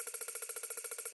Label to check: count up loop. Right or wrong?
Right